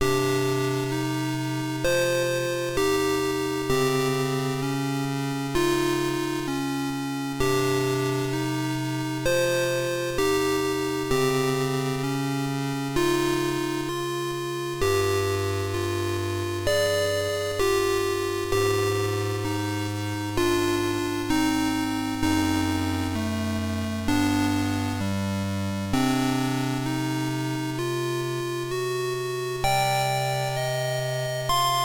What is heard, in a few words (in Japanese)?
バッドエンド (FM7 PSG版); Dome